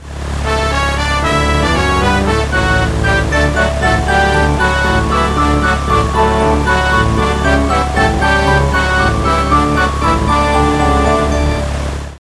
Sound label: cinematic plane flyer; Theme Park